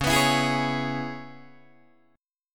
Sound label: Db Minor 7th Db down Guitar DADGAD; strummed